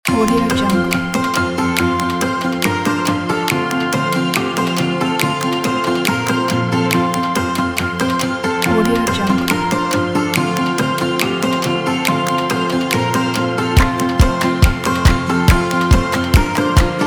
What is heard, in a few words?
acoustic indie folk pack